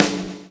PULL, Backbeat; Spectrasonics - Backbeat

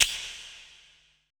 shaker; BPC PERC

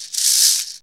RATTLER, RATTLERS; Zero-G Ambient 1

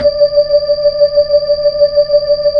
B-3, Hollow

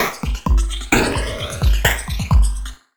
Bumpers; Sonic Foundry (Sony Creative Software); Esoterik Beatz; Messup Fx

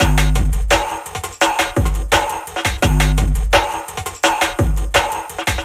Outside Beat, Drum N Bass; Sonic Foundry (Sony Creative Software); Knutrix Drum & Bass

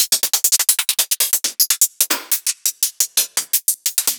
Closed Hats; Ghosthack

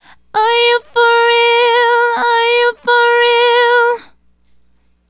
Female Solo, Vocal

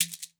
CABASA; Xsample VOL-9

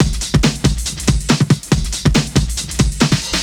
LoFi Beats, 140 BPM

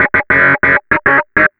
DISTLOOP, Big Beat Samples

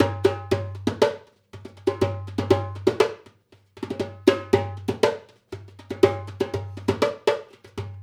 Dumbek; Essential Percussion

